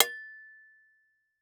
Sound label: Impact Shovel Hit By Medium Metal Pipe